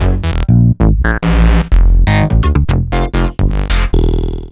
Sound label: Synth Basses; Sliced